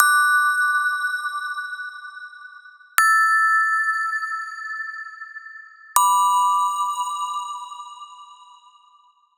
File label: Phase Plant